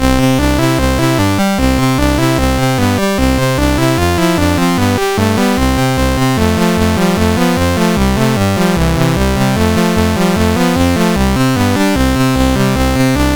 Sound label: MOS6581R2, GAMES; Vietnam